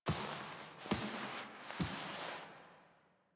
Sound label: Foley; Warframe; Quests; Zariman; Epilogue